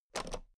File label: toolbox latch open regular